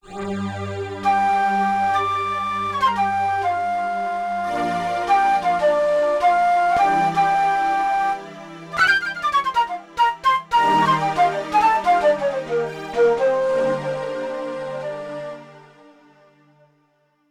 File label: Flute Split, Yamaha TX16W; Combo Performance 1; MULU-Flute Split